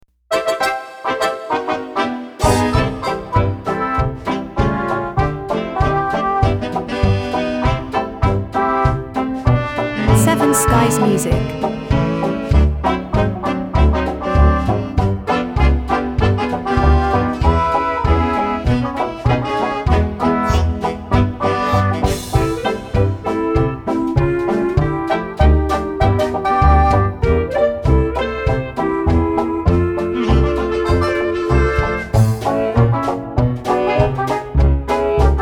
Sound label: Dixieland